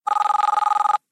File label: ring